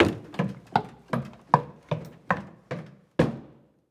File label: Stairs Up Fast; Footsteps; HauntedBloodlines